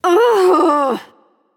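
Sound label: ugh, female; smite; voicepack; hera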